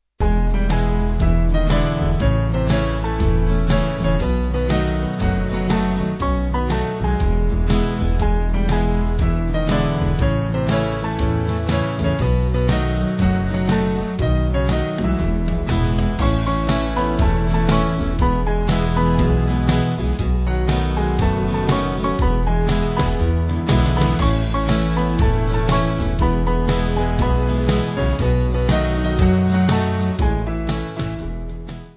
Band

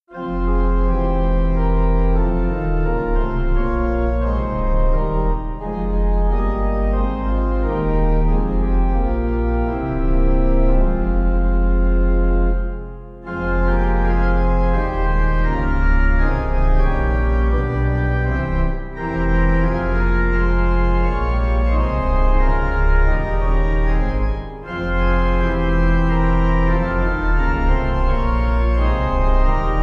Mylor Pipe; A Time To Watch

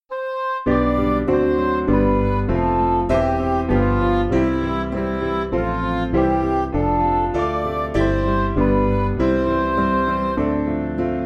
Midi; Everyone Extol Our Lord; Trallwm

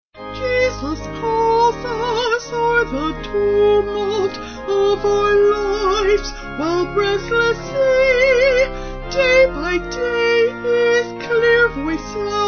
Vocals Organ

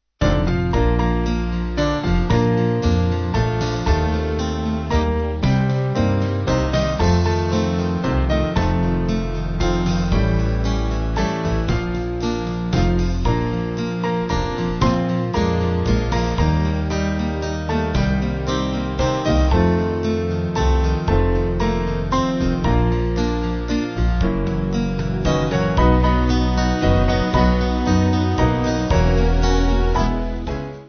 Band

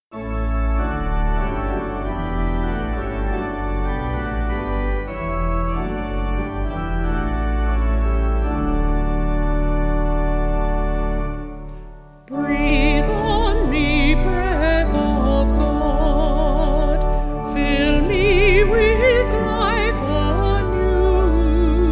Breathe On Me Vocal Organ; Carlisle

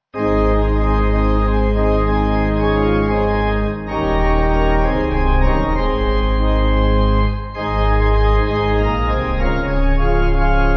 O The Unsearchable, Pipe; Sweney

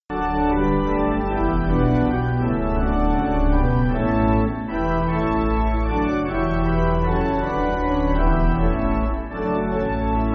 Pipe, church music

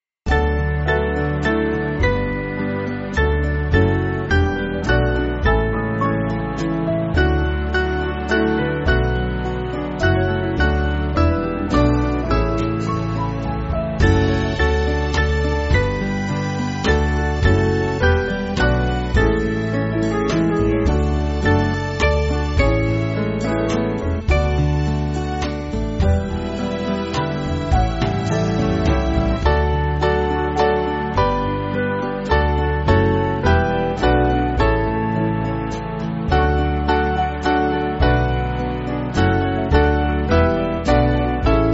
Band, church music; The Strife Is Oer; Alleluis; Victory

